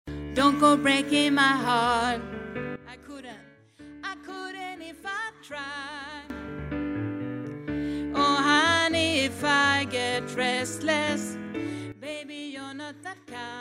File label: tenor